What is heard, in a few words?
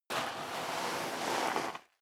step sneaker gravel light slide long; schritte